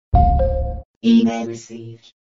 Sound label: email sms